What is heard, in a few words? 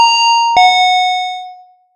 convenience store